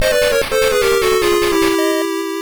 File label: Failure Jingle; bakuryuu sentai abaranger; pico